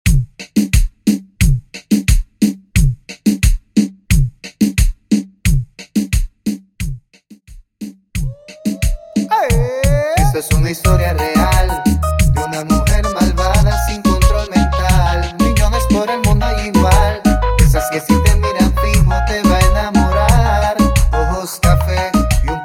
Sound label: Reggaeton To Salsa 89 BPM; transition